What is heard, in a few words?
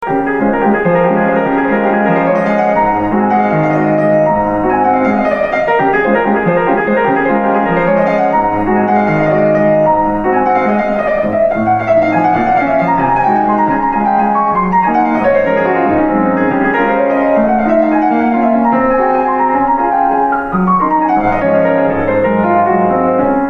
Piano Clasic